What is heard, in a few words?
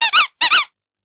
squeaks; Alcatel OT-756